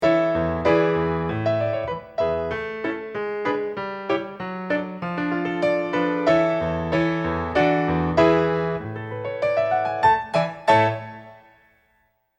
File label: accomp